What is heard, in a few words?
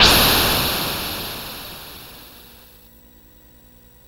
C64 Filter Explosion